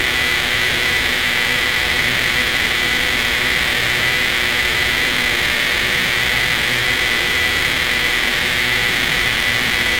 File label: a seamless loop of dense, electrical